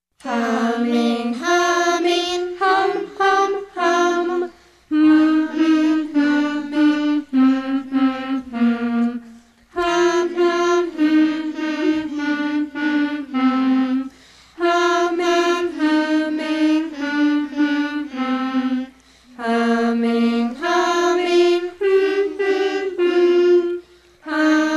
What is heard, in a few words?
Humming Gum